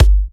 G, kicks; markus hakala kicks

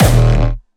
Distortion Kicks Kick D#1; Bassdrums; Vengeance; Essential Clubsounds Vol.4; Bonus TonalHS Kick

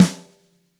Gretsch Snare; 1967 Gretsch Kit; One-Shots; Sonic Foundry (Sony Creative Software); Vital Drums

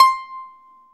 TZOURAS C 5, Mediteranean Traditions; Soundscan vol.53; AKAI

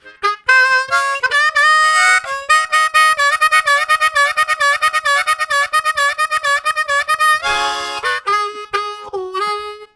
All Funked Up, Harmonica Riffs; Zero G - Harmonica

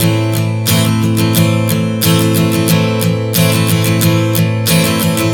Strum 090 Am, One Chord Strumming 090 bpm

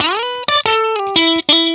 RIFF 120, Vintage Blues Guitar; USB Soundscan vol.22

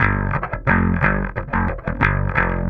Bass

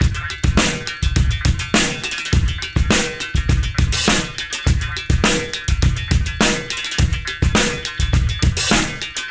fx, Beat Loops; Zero-G; Monster Beats

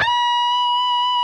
PRS C, Guitars, LEAD; Spectrasonics; Hans Zimmer Guitars VOL-1

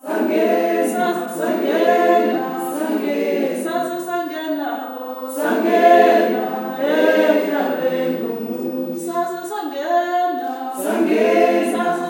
SWAY CHR, FEM CHOIR; Spectrasonics; Heart of Africa 2

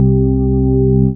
DM ORG1, Dance Mega Synth

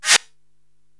SD Ambi Reverse, Korg TR-Rack drum machine

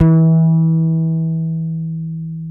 HOLLOW FRTLS F 4, Bass; Spectrasonics; Bass Legends

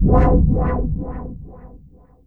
WOBBLE; COMIC; AKAI Anime Sound FX

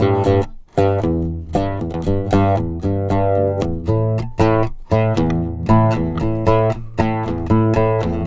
Oud, Arabic; Sonic Foundry (Sony Creative Software); Continental Drift Aftershocks

